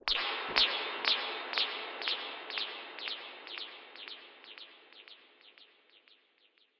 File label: echo blip thing